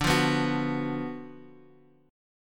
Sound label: F sharp Major 7th Suspended 4th Sharp 5th, D, chord